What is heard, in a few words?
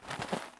flakes draw; Escape from Tarkov